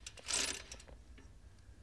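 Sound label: Bike Single Pedal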